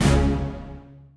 Combo Even Hit; Duel

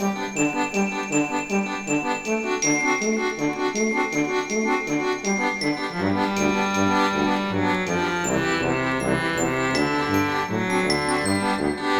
Music DownBeat B Other; GnomeGnight

